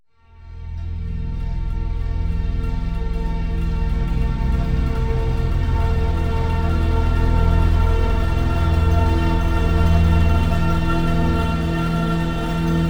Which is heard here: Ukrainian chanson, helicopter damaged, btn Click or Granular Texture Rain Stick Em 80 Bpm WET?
Granular Texture Rain Stick Em 80 Bpm WET